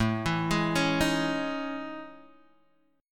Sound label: A Major Sharp 11th, Guitar, Open G